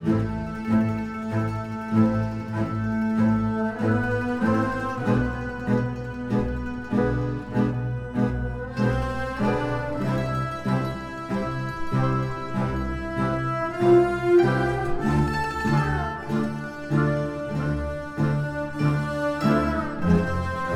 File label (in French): Clavecin avance Classique